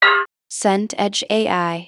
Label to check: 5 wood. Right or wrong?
Wrong